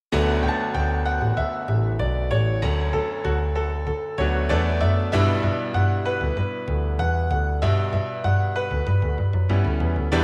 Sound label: normal bgm weekday; Dousei